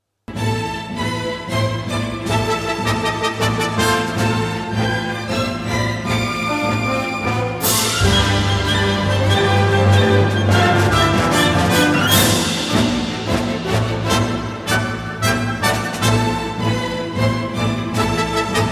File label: Orchestral Theme; FF8